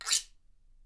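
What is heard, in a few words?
guiro, Percussion; Miroslav Vitous - Percussion & Harp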